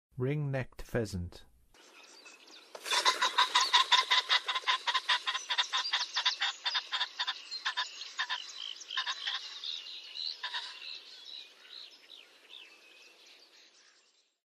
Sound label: Ring-necked Pheasant, Songs of Birds of North America; Western USA; Western USA Songbirds